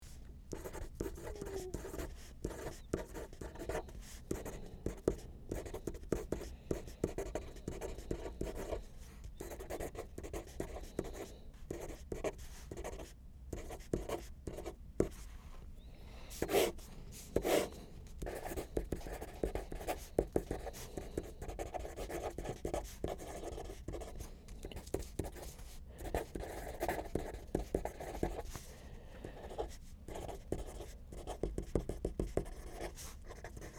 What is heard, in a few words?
writing